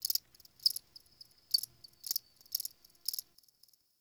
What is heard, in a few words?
Insect Night; Warframe; Eidolon Plains; Ambience